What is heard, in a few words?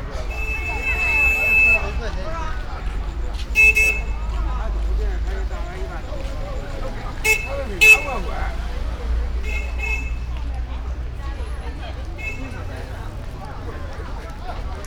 Beijing Bus Street; China; Sound Scapes